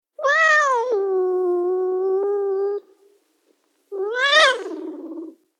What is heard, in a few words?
Cat Whine Vintage; Cats; Animals